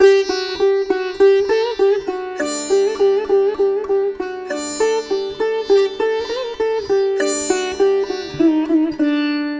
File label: Sitar D MAJ 100; Indian sitar; Indian Sitar Vol. 2